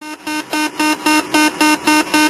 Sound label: 747 siren